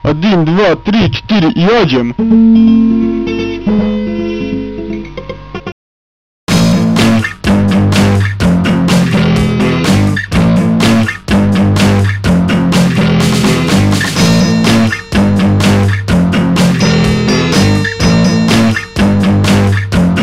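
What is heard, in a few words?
russian guitar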